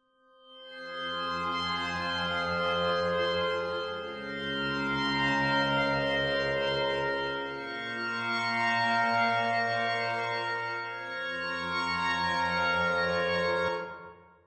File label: ATMOS Crypto Signals; Synthelium